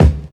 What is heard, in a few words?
smooth kick single hit d key